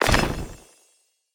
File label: ground ice crystal; mine; battle